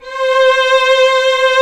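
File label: strings, 8pc, vibrato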